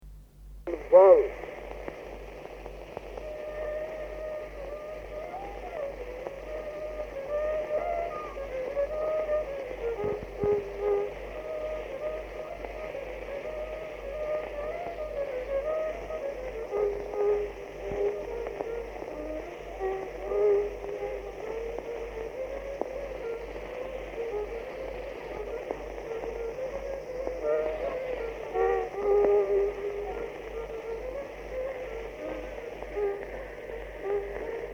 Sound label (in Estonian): Gammal vals Vana valss viiul folk; Ruhnu; Elias Schonberg